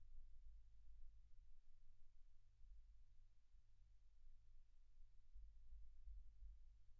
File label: silence 48kHz